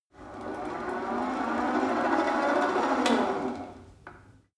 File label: rope trainer pull sequence slow short; sport freizeit; fitnessstudio